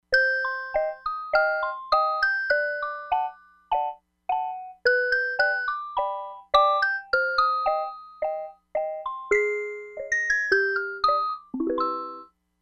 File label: MALLET BELL, Crumar Bit 99; Factory Programs